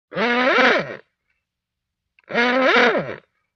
Old Klaxon Car Horn, antique horns

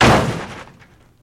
Jeep Crash Metal, car crashes

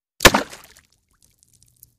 Bullet Impacts Water

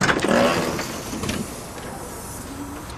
Hospital Door Open A, pneumatic

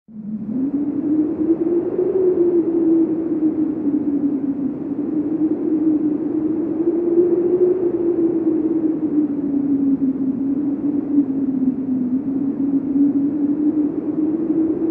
WEATHER - WIND LIGHT & MEDIUM: Light breeze.

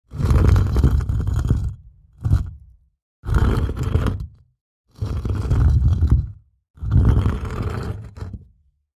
Stone, Heavy Move, Push, Long x4

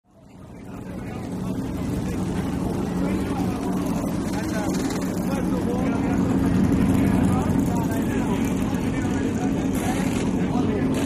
Sports Car Race Pit

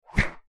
Quick Single Rope Swi, swish, rope

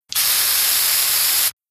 Aerosol spray various, aerosol can; FOLEY PROPS AEROSOL CAN: INT: Aerosol spray various.

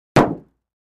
Car Roof Impact

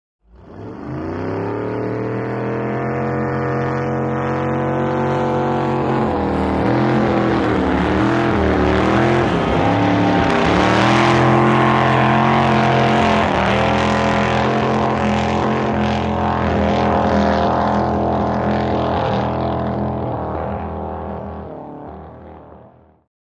Drag Boat Start by

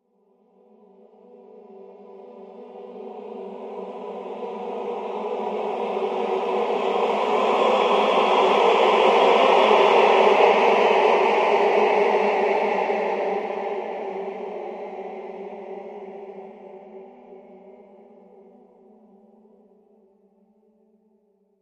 Music Trans M Choir, choirs

